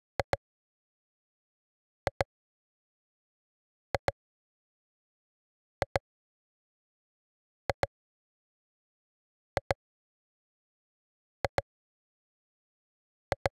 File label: perc, Key E Bpm 128; Loop Nerds; Big Room House Allstarz